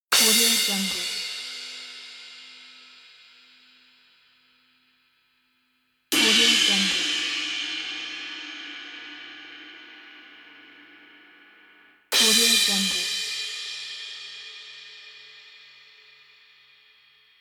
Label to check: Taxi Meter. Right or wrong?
Wrong